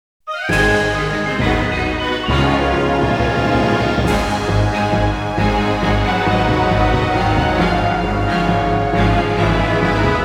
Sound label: Victory in Battle; Skies of Arcadia Eternal soundtrack